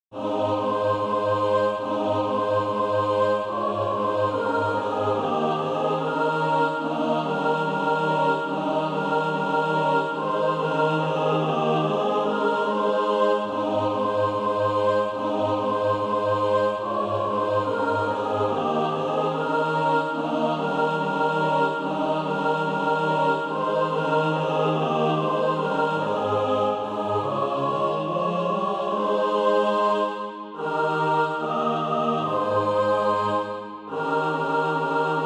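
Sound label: mixed, Practise Music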